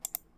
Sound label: click mouse